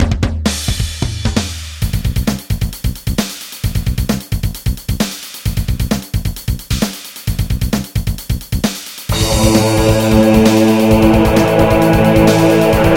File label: Bass